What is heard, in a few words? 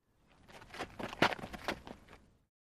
Basball FS Mvmnt, baseball; baseball fx & games